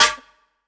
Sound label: Snare, 808; Sample God